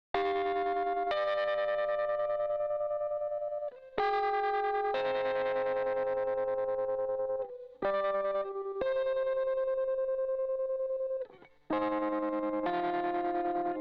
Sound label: tremolo guitar